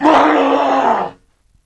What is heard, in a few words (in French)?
cri, FIGHTING; East West; Global Sounds